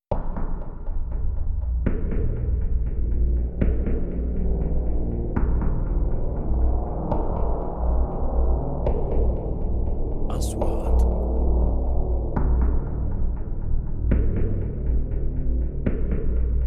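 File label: 3D SFX Trailer; مؤثرات تشويقية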